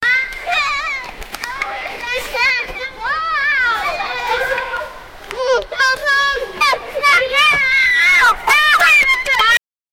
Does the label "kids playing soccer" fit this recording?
Yes